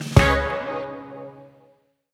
Fanfare Get Golden Disk

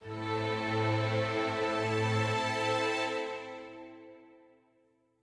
sad forte end

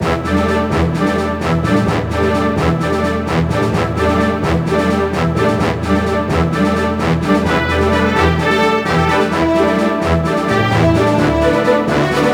Battle; mercenaries wings the false phoenix

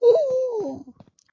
Hold scrotch fidgets loop

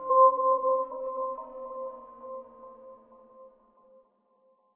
Event Feed

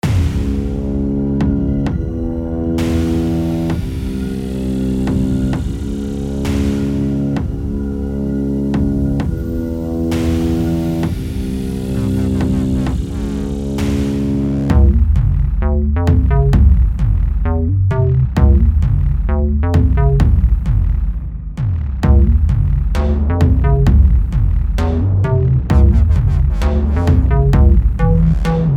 Doom Loop; block survival legend of the lost islands

